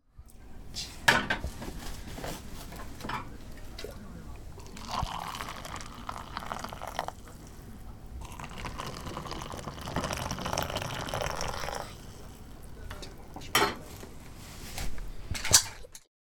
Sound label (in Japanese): kappuraamen ni oyu; カップラ〜メンにおゆをそそぐ音; Chino Cultural Complex; 茅野市民館